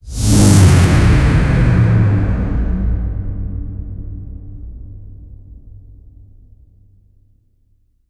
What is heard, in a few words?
cinematic fx downer sound